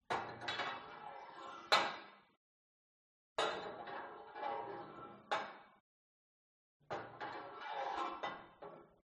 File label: SPORT GYM Put Weight Onto Barbell